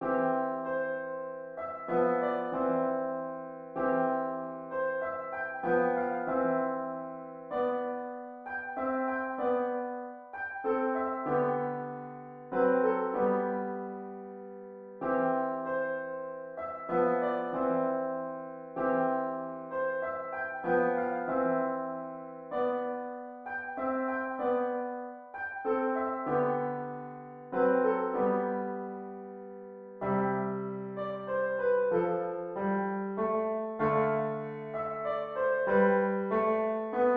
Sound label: clarinet concerto piano